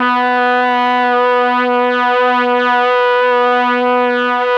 Mbrasb3, MelloBrass; Oldies

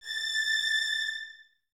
Violins Detache A 6; Miroslav Vitous; String Ensembles

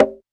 bongo; Quasimidi Technox

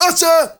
male solo, male noise; Heart of Africa; Spectrasonics; Heart of Africa 1

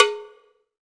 COWBELL, HR-16 Drum Mashines; EdgeSounds

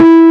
E2 N-HARM, GUITAR; AKAI S-Series CD-ROM Sound Library VOL-8

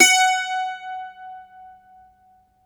MANDOLIN, Plucked Strings

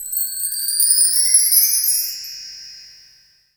MARK TREE, Akai MPC-3000

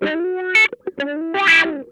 MAD CAT, Funk Guitar; Zero G - Funk Guitar

